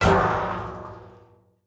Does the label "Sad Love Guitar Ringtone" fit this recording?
No